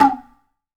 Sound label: KOTSUZU, tuzumi; AKAI S-Series CD-ROM Sound Library VOL-5